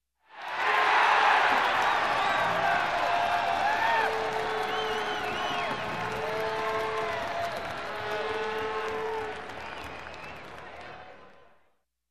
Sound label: Crowd cheering with horns